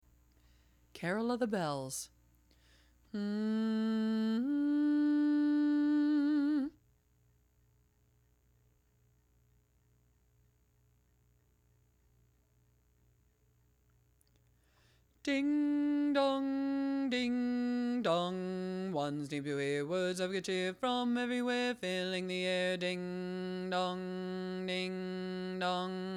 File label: Carol Bells bass alone